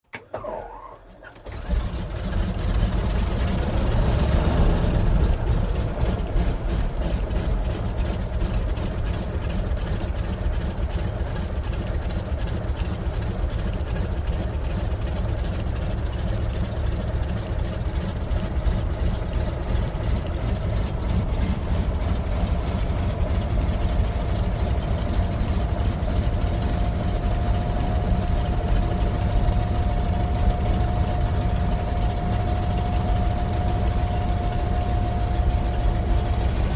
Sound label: Electric Start / Idle; Wheeze And Start, Low, Rich, Steady Idle. Close Perspective. Prop Plane.; broussard